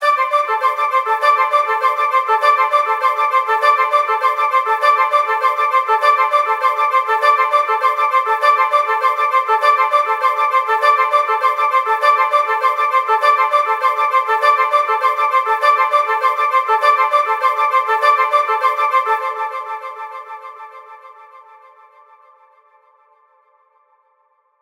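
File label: Flute Ostinato A minor 100BPM; Construction Kits; STEMS; Ghosthack; SkyRealm; Kit 10 - Windhelm Forest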